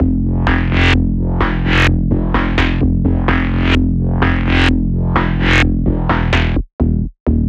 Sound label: 128 Fm, Melodic Loops; M8; Electronisounds